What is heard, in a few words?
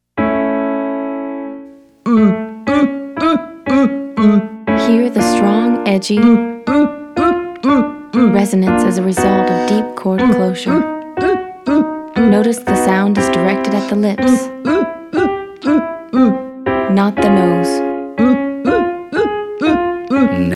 Exercise 4 males